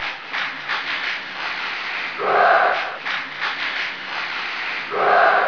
clap clap; Ssoccer

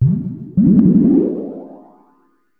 AMBNT 160; Ambient

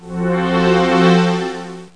mail

